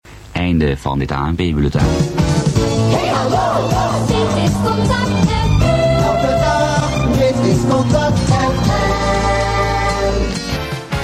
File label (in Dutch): jingle compilatie; Contact vs Digitaal; AIRCHECKS CONTACT NIJMEGEN